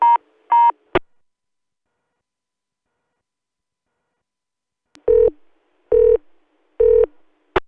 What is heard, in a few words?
Tone